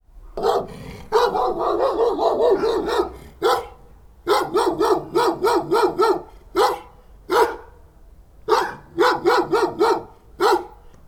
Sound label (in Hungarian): házőrzők ugatnak; állathangok; paraszt udvar